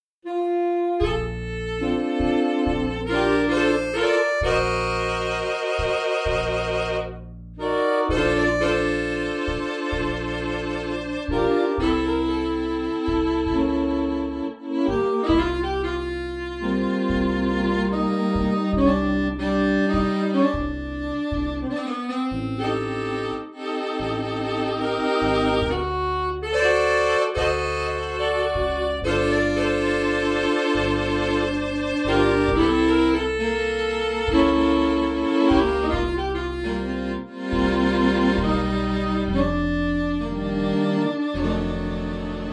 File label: Ballad ed Gar Rev